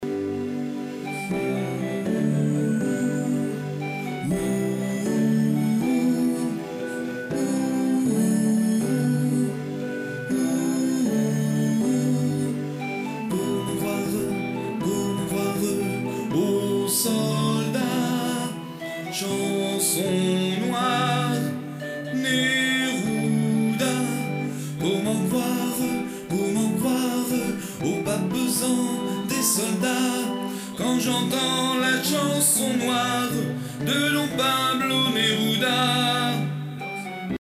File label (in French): TENORS; Pablo Neruda